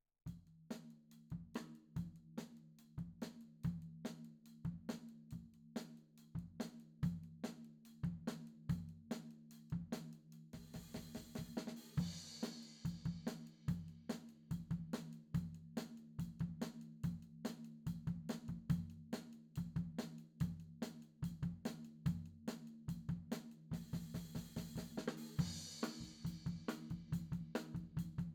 Floor

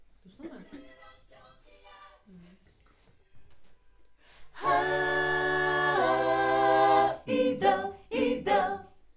Schoeps AKG, piano

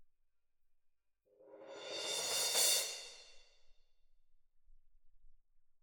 Perc

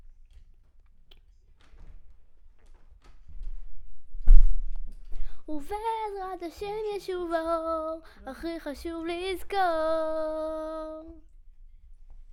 Children Choir; Studio Bekol Children Choir

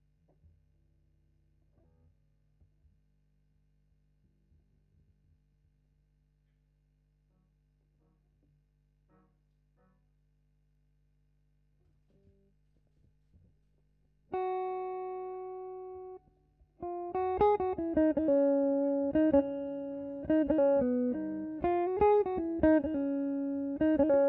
GTR B

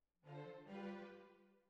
Cbasses

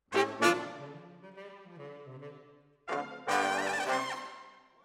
Sax

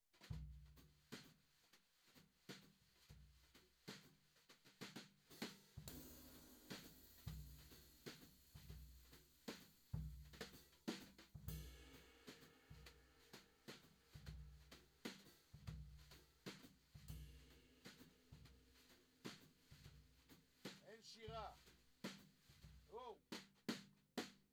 Floor

